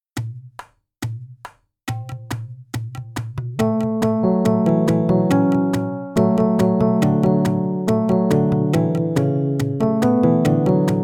Peaceful Sea (Musicbox)

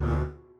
lose sound

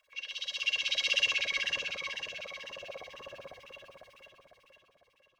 echo gurgle whoosh

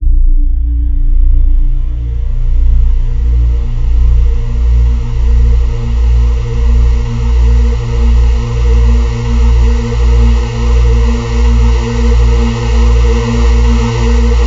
Ambient Pad

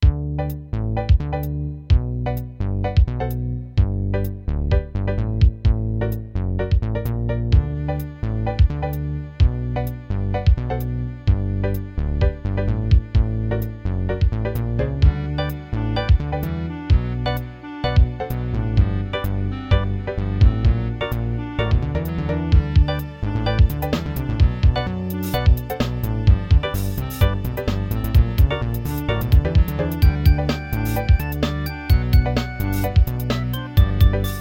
Melody simple loop

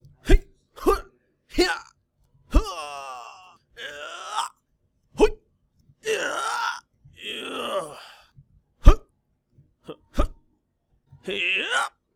Effort Noises; Valiant Genericus

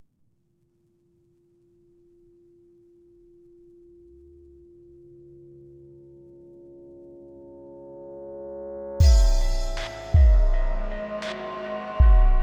zombie main music

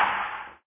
ping pong bat

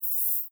robotic squeeze

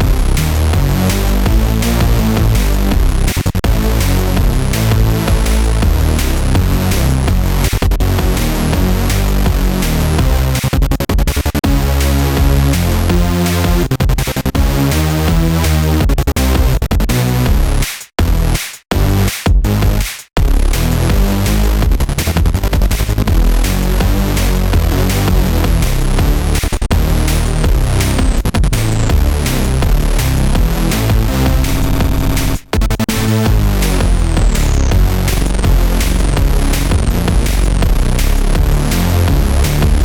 Battle dirty

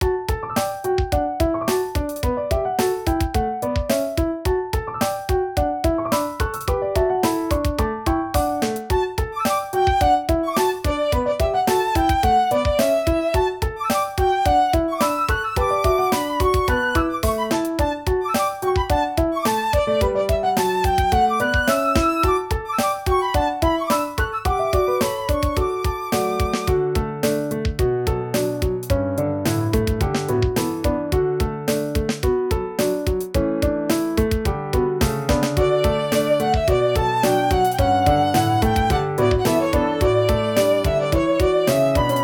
piano bgm pop ver

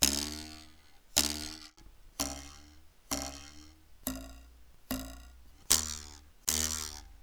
plastic knife

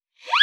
cartoon throw

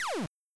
laser; Die for the Empire

